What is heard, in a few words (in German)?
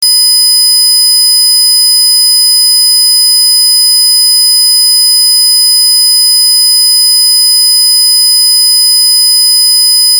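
Sägezahn 500 Hz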